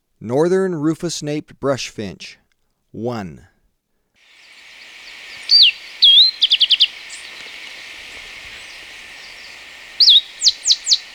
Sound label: Northern Rufous-Naped Brush-Finch, Birds of Ecuador; S. America